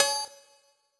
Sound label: One Shot Synthobell, Drum N Bass - Percussion; Sonic Foundry (Sony Creative Software); Knutrix Drum & Bass